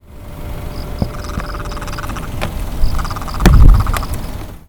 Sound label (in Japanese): daisagi, bird song; ダイサギ; 鳴き声; ペリカン目; サギ科; 新潟県新潟市秋葉区 田んぼ; J-eco 鳥のさえずり図鑑